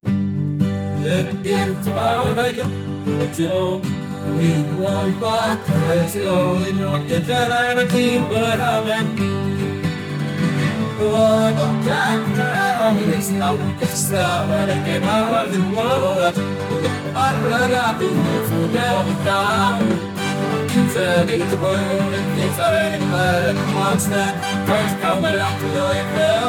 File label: russian folk futuristic